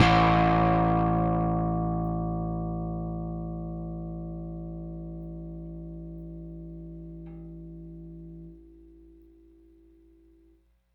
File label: Rickenbacker Bass; Airwindows